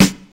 classic short snare drum g key, steel snare drum sample tuned to the G note